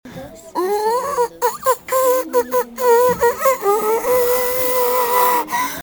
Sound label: ahahaahahaahahahahahahahahahh remixxxxxxxxx